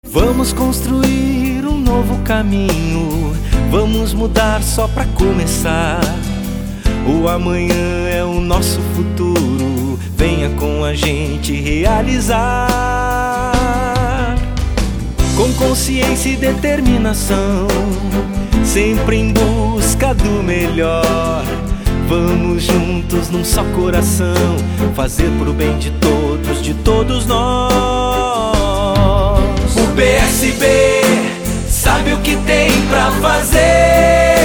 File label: Jingle; PSB; institucional; radio beto